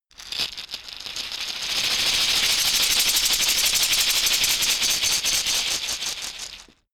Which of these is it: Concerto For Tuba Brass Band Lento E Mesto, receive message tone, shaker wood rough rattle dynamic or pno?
shaker wood rough rattle dynamic